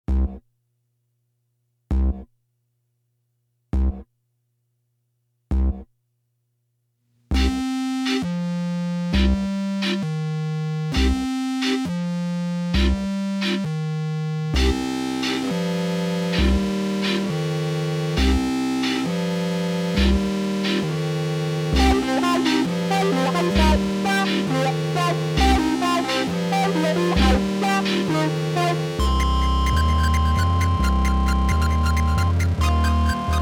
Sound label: stairsteps, distortion